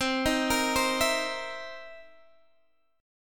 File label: C Minor 7th Flat 11th, C, guitar chord; arpeggiated; Cm7b11